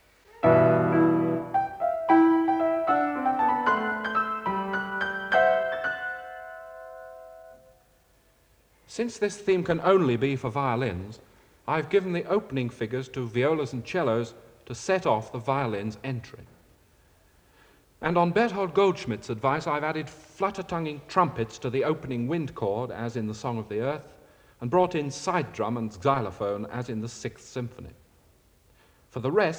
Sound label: Music Example (Piano)